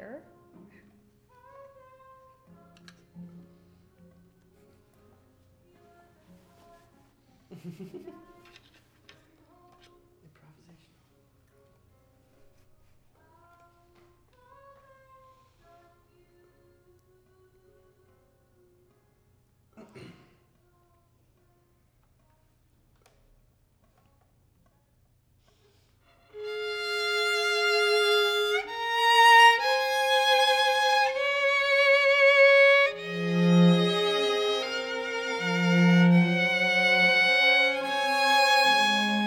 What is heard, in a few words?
violin